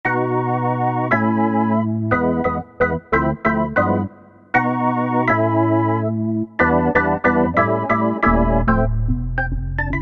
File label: Solo Trem, Organ